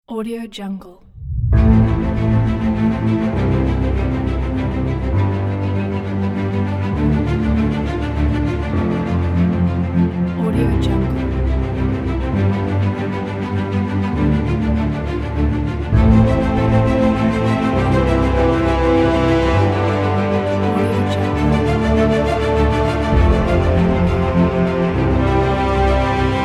epic cinematic; z-studio

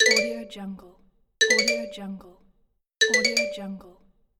xylophone

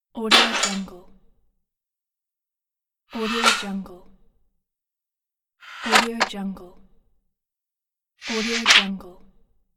close science fiction door

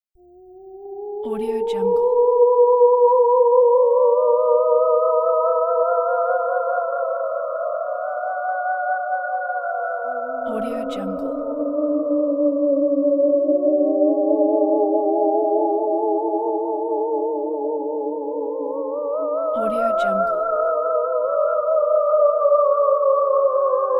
ghost wailing

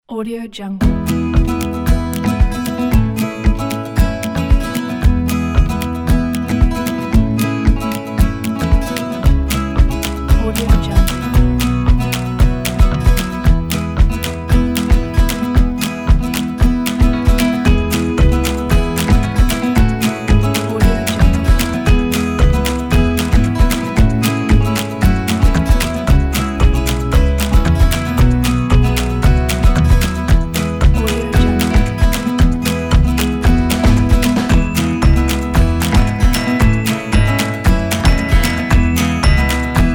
uplifting and positive

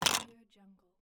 dvd drop; stormwaveaudio

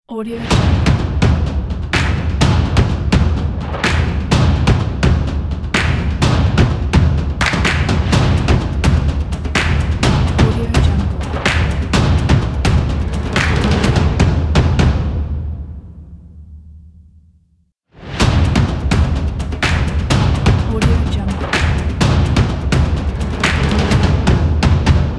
football drums logo

